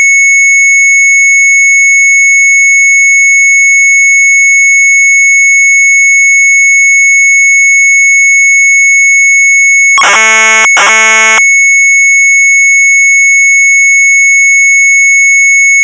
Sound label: MZ800 Tape; sharp; PLUS EEN; retromelsarchive